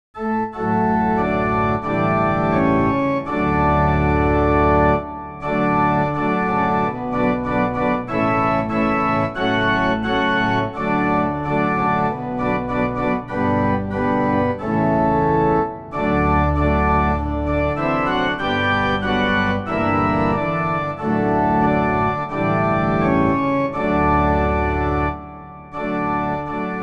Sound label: inst; Kirchenlieder; danke fuer diesen guten morgen